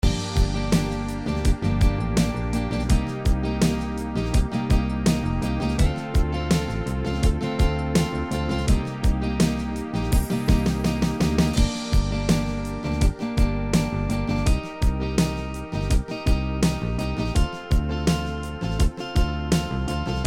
brt pop

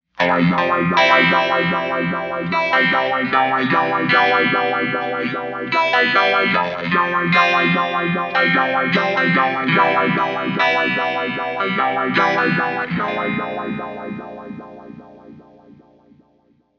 reverse autowah

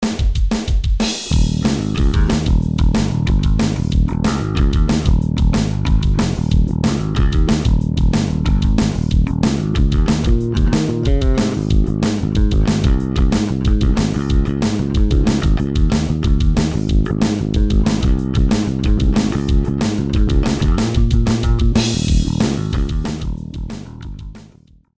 Pop Rock Bass

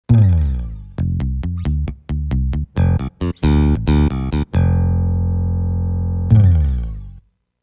Mega Pick Bass; Tyros

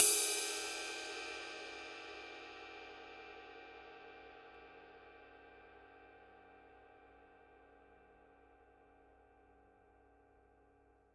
ride body

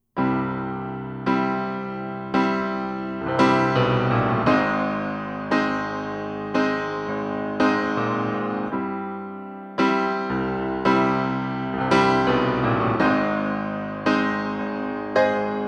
Piano

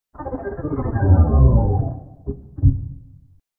B3 Slide Down

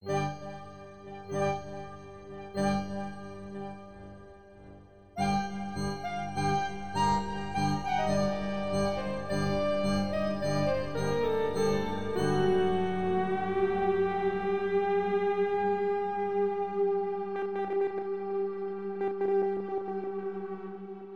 middle east

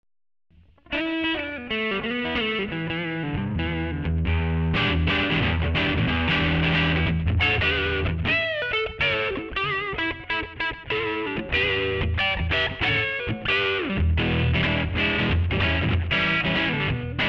texas

